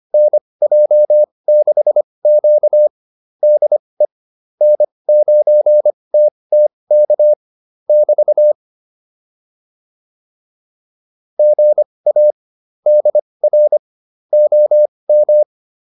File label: QSO, intermediate practice files